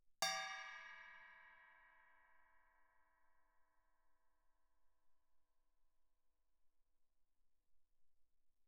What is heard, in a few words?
chinese stick bell mf, suspended cymbals; Percussion